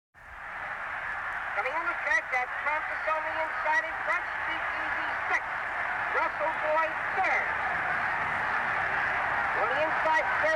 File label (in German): sport - pferderennen start